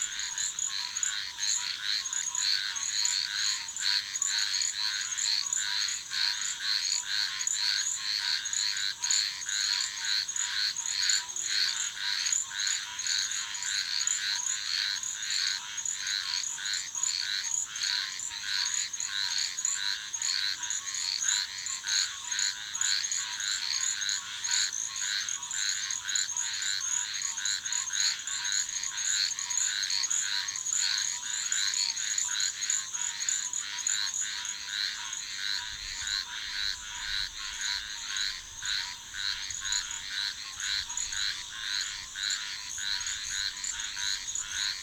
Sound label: swamp ambience night; Nature Ambience; Soundrangers